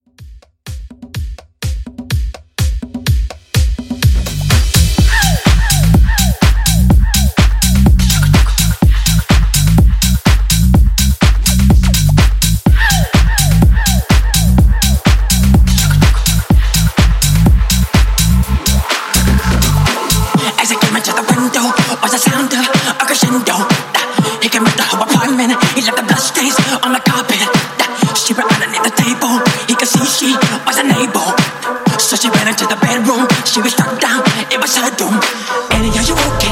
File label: 80s Pop Redrum; Remixfreakz